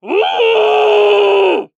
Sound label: Cartoon Human Male Scream Frustrated Scream; AdobeCC